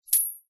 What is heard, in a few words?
foley money coins hit coins in air ring